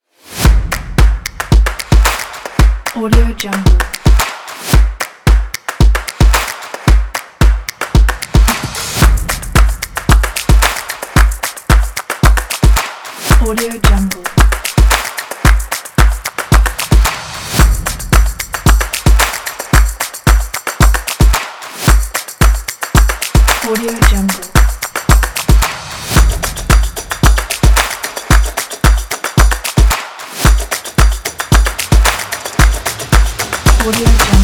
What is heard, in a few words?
stomps claps